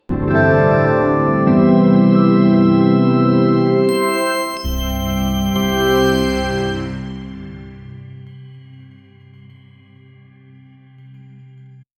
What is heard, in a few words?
big bonus announcement, casino